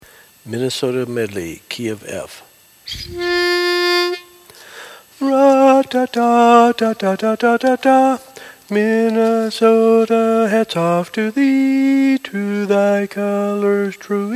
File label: Lead Predominant, Learning Tracks